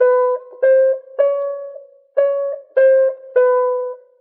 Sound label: guitar high frets, Jazzmatazz Loops